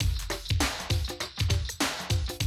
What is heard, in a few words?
drum loop, 100 BPM